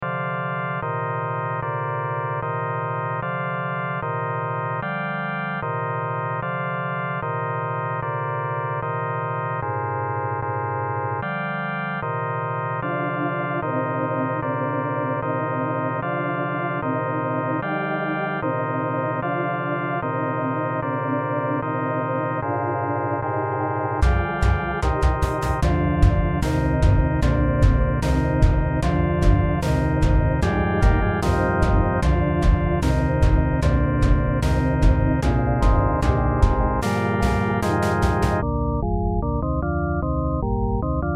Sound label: epic organs